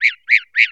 Bird Call; moul

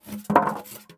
Styrofoam Hit

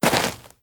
Dirt Walk

